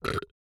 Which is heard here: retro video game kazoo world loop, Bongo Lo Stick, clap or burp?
burp